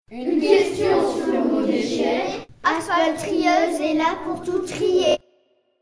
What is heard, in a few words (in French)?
cri slogan; haspeltrieuse; supertri; concours